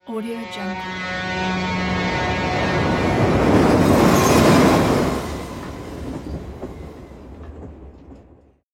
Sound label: the train pass by